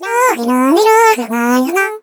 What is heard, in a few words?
Slime Joy; Seer; GamigoNA